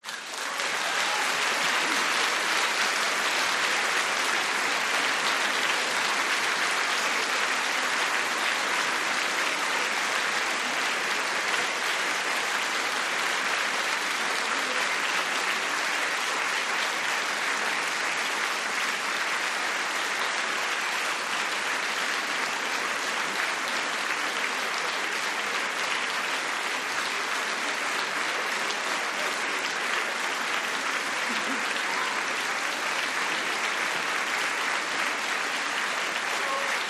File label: Audience Appls Long, crowds int